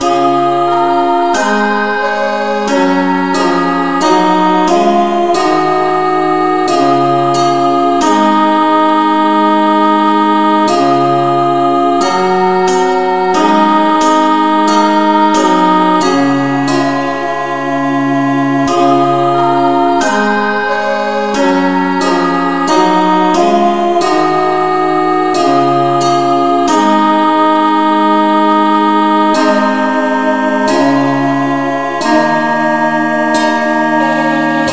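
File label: no vocal key C sharp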